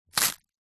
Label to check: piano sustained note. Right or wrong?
Wrong